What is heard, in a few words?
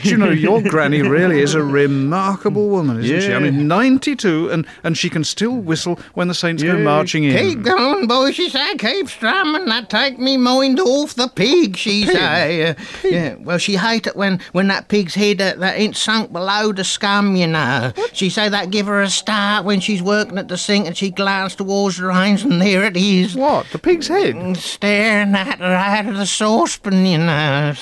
A Clean Banjo